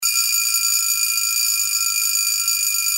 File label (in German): memovox, armbandwecker